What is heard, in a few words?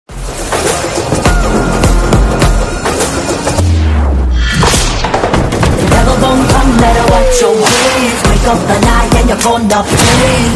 taster cut bgm ringtone; baby john